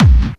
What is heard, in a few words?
Bassdrums Clubby, Kicks; Vengeance - Essential Clubsounds Vol.3